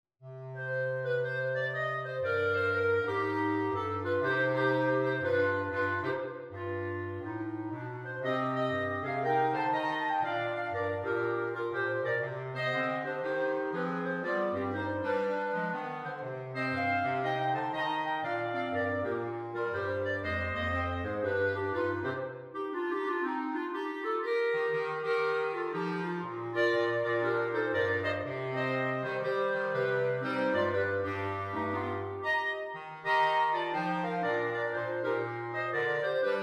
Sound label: clarinet quartet; greensleeves